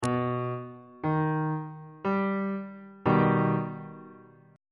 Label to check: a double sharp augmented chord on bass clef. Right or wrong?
Right